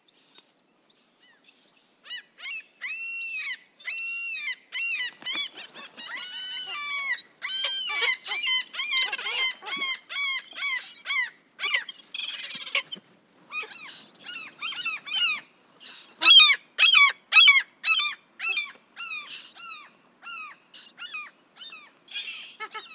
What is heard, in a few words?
seagulls; best service Blue Box